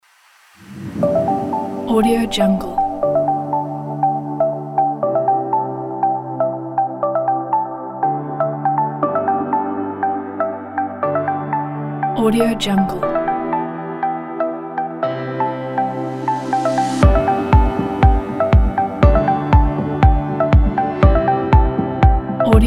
inspiring waves

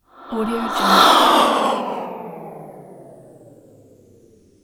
female ghost

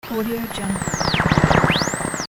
tuning in static; sound ideas

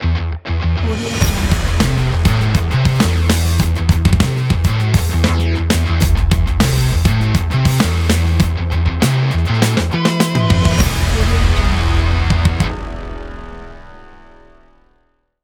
rock logo

